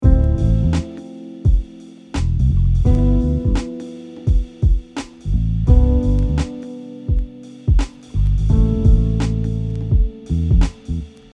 Voice leading example, Chord Tone Voice Leading, guitar; Chord Tone Voice Leading example 2